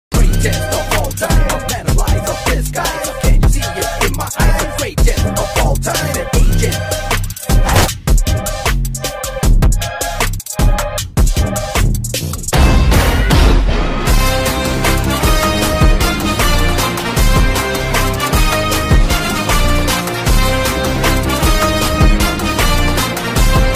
bgm; interval block; thalapathy vijay; venkat prabhu

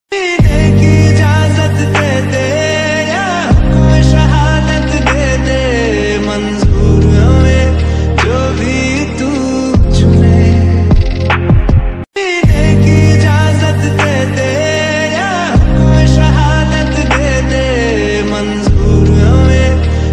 desh bhakti ringtone; independence day ringtone; 15 august ringtone; happy republic day

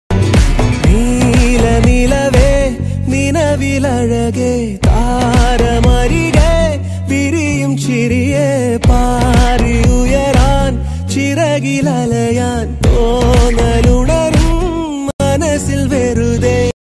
love ringtone

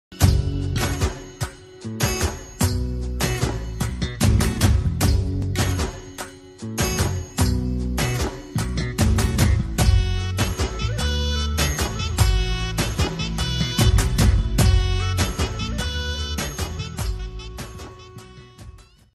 drum beats bgm; Allari Naresh; Naandhi

